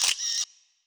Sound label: camera; Garry's Mod; tf2